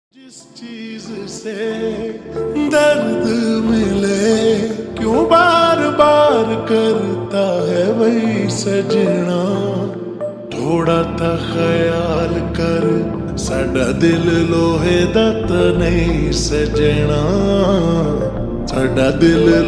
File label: Slowed Reverb Ringtone